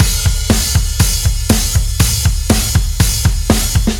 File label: Heavy Groove C, rock